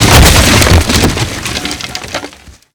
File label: Projectile Sounds; Red Orchestra Ostfront 41-45